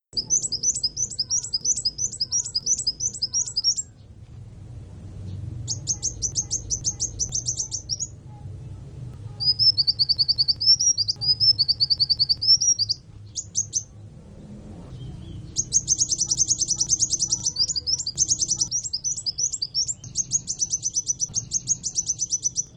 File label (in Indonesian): Suara Burung Kolibri Kelapa untuk Masteran